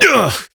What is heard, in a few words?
Pain, Adult Operator; New War